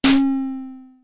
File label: Tom, Drumfire DF-500